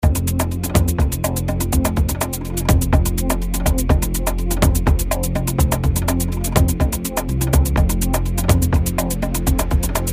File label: Chichewa F Min 124 BPM; abt sounds sunrise sample pack